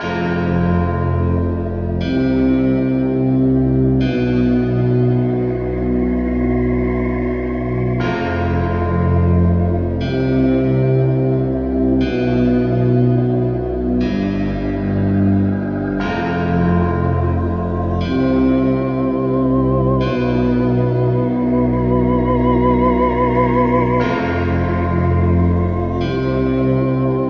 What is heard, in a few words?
keys, Sad; loops